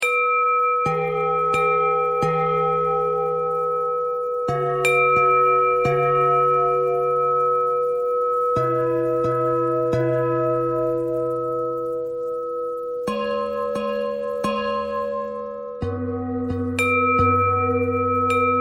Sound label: bell thread pentatonic